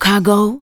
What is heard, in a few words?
vox, Techno Trance; Ueberschall